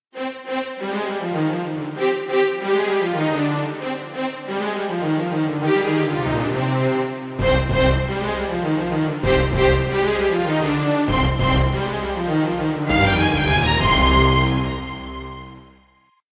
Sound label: short danger; theodyssey